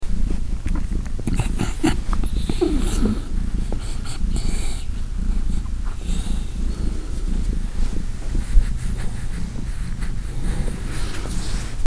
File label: dog and cat; DOG FOOD / IS BEING SERVED